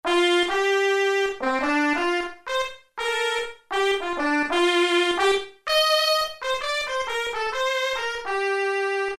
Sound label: Mute Trumpet; Roland PN-D50-03